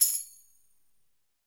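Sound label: Tambourine C Shake; Latin Percussion; Drums; Drum Hits; Ableton; Factory Packs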